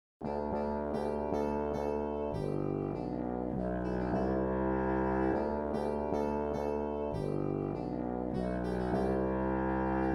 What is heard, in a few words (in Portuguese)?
trombone de vara